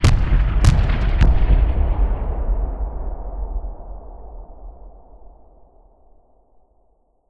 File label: city battle